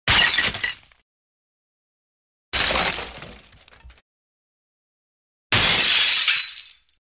Glass Shatters Light Light Metallic Glass Shatter &; Hollywood Edge Foley Sound Library